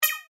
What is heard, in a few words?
Digital Beep Smudge Click; Digital Clicks, Blips & Alerts